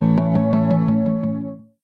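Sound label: Samsung Galaxy S22 ringtone notification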